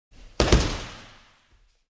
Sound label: Judo throw on dojo flooring